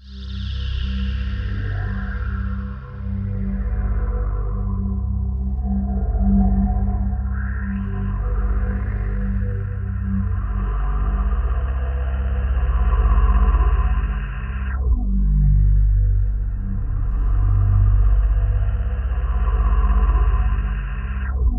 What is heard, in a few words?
Roland JV 2080, poly saw